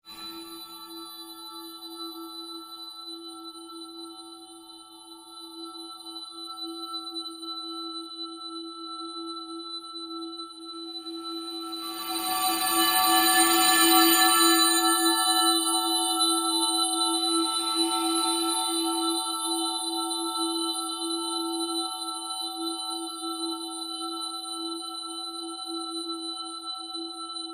surge fade slow, Sound effects created by manipulating other sounds; Rise And Dive Sound Effects